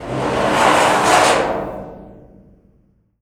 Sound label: METAL TEAR; PERCMETALIC